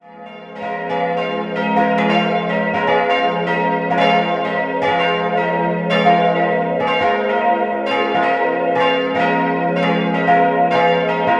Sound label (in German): glocken; Bad Heilbrunn